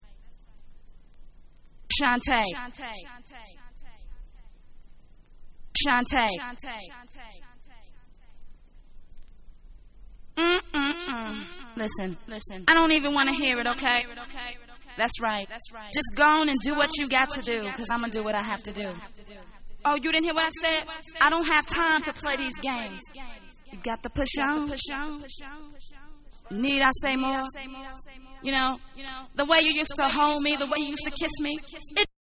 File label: Acapella